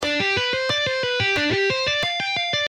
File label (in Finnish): 4 likki